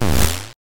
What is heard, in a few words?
snowman kill